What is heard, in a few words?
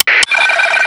Radio On; mohaa; mechanics